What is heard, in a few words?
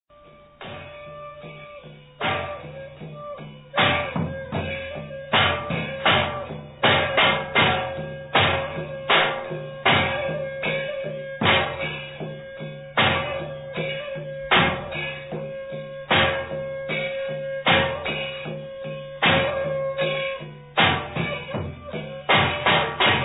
Instrumental Music, Kong Thum (Thum Drum), Kong Hang (Hang Drum), Sanai, Seng, and Phang Hat; Sep Laum Mu: Chang Va Xeung Bang Fai; Savannakhet/2000